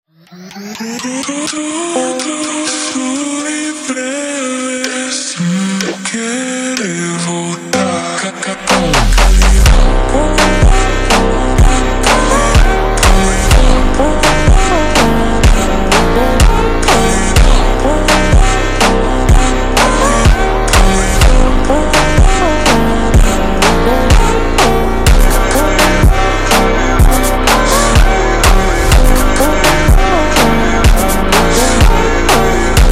Montagem ultra Slowed

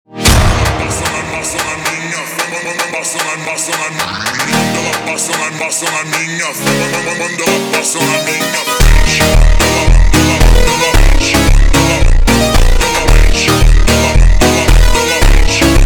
Funk slowed

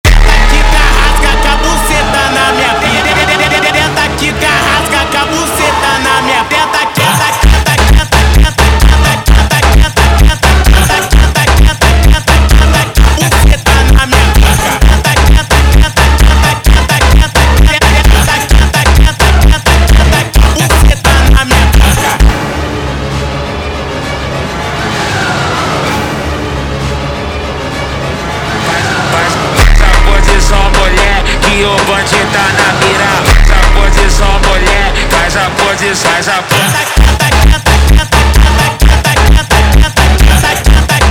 Funk

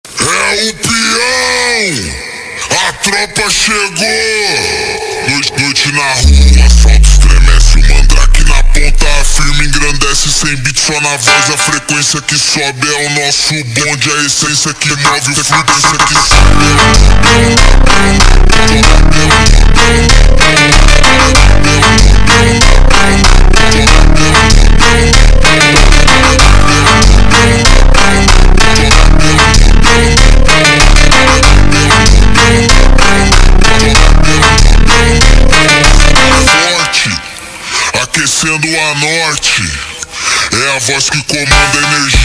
Montagem super Slowed

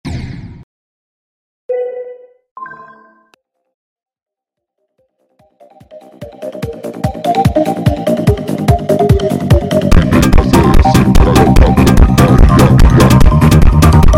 Funk sped Up

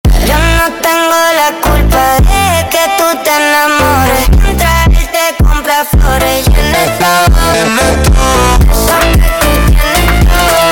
Montagem Slowed

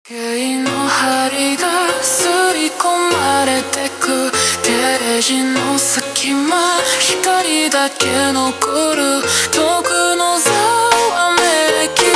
Montagem slowed

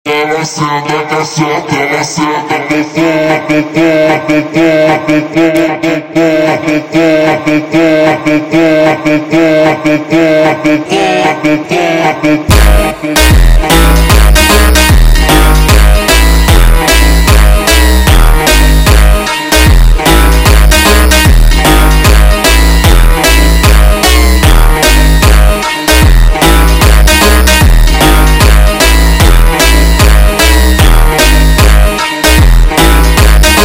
Montagem Super Slowed

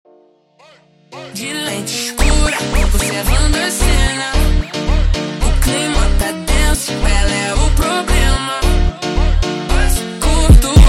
Montagem ultra Slowed

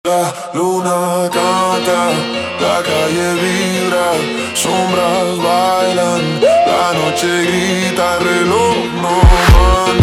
Montagem slowed

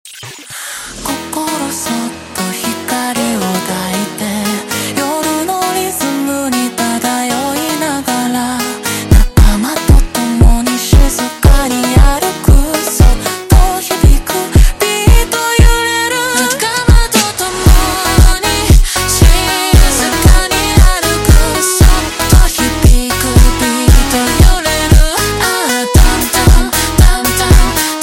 Montagem super Slowed

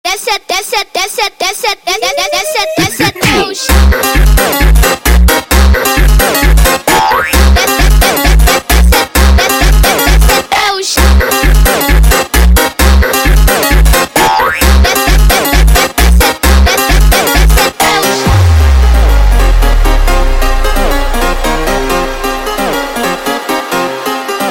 Montagem sped Up

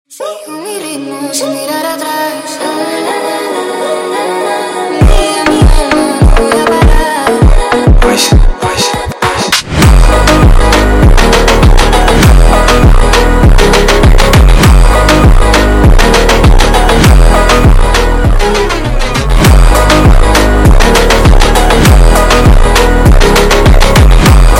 Funk sped Up

